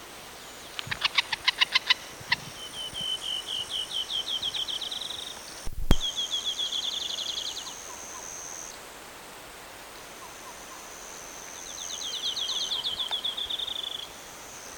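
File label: snipe Madagascar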